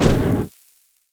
Fire Enemy Footstep; AudioWizards

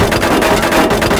Bullet Hit Metal Loop; Destruction

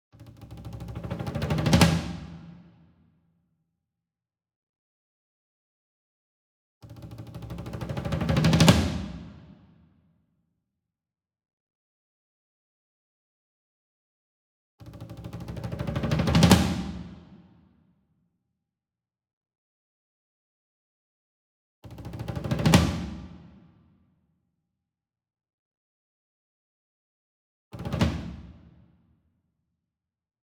SINGLE DRUM Tom Concert Roll Hard Beater Medium; boom library; Cinematic Strikes Construction Kit

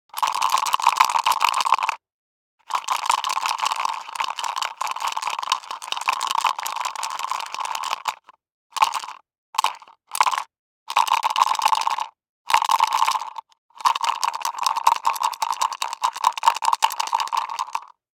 DICE in Plastic Cup, Shake, 2 Two Dice; Samples; ArticulatedSounds; DICE Sfx Party (Extended)

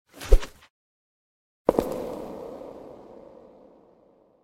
Stone Fall; 3M

